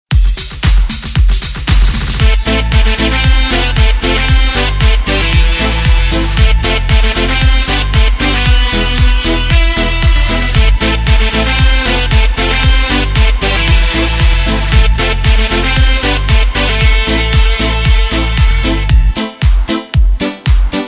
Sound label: Korg Pa3X